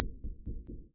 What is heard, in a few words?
Running